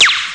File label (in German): Zisch; ST-XX Amiga Soundtracker Sample Packs